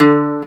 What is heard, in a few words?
C GTR SOFT D2; USB Soundscan vol.42; AKAI